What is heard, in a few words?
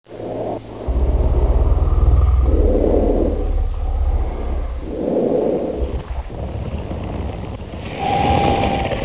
growl hiss